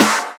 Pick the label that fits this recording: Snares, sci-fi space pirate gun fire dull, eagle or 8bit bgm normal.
Snares